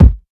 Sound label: subby kick drum single shot a sharp key